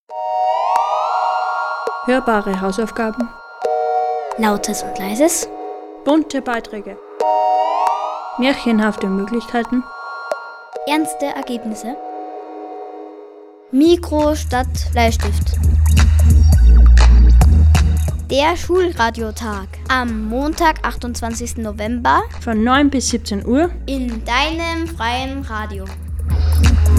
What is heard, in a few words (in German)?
Jingle; Schulradiotag16